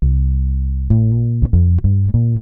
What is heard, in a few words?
bass, Loops